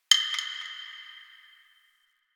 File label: Interior Distant Marble Hit; Warframe; Ambience; Nineteen Ninety Nine; DE